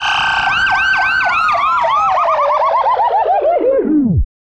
police

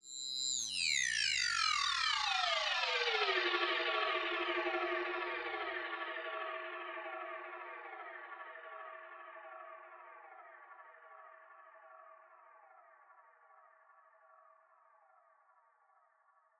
synth fx

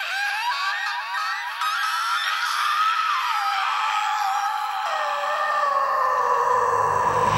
SCREAM

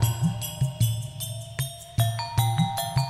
bell loop Bb

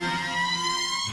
ALARM (C or C#)

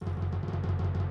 DRUMFILL

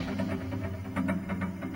GUITAR RIFF; MK OST GB

